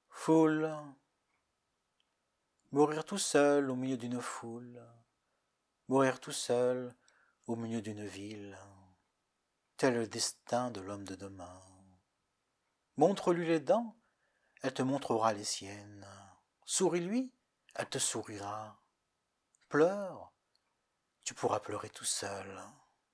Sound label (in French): FOULE